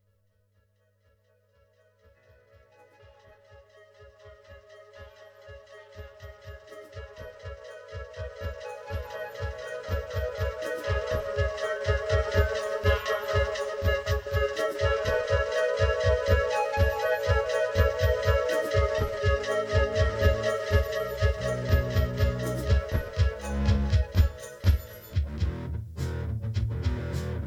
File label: Flute Interlude